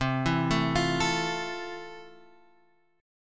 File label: F Major 7th C